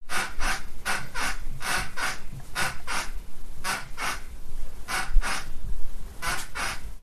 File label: bedspring squeak; soundbible